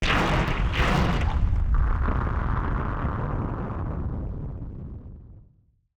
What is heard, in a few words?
Firework FX; musicradar; bomb factory